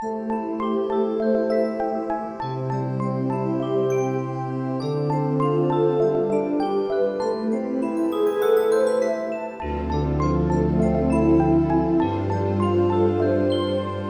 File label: Drill Chill Loop; Dr Kawashima's Brain Training for Nintendo Switch